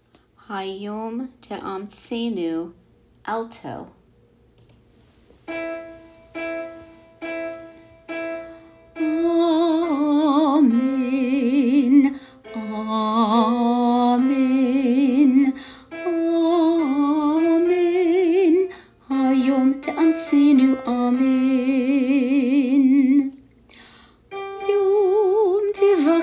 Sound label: Alto; High Holidays; Hayom